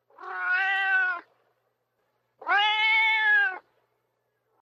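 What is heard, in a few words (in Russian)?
кошка просит открыть дверь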